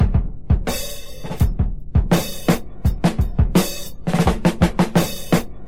84 bpm high quality drum beat e key, kick tuned to the E note